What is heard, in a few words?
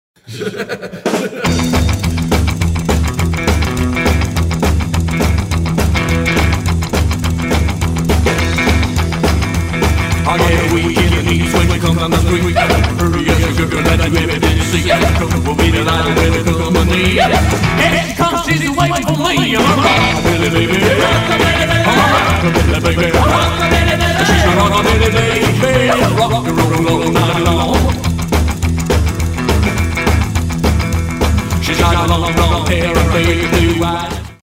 Rockabilly Party